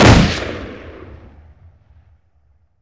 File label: automatic shotgun fire, weapons; gesource; autoshotgun; npc